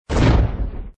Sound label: boom; Ben 10 - Hero Time Game; ThrillGames